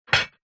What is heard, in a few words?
household stack of plates dishes set down on wood surface